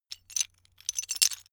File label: metal pieces clatter